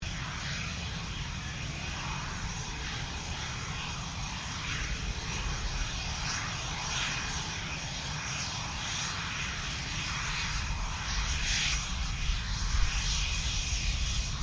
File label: AIRCRAFT FA-18 HORNET IDLE LOOP; RAAF FA-18 Hornet; Airplanes (Sound Effects:Vehicles)